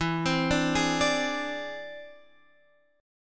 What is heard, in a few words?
E Augmented 7th E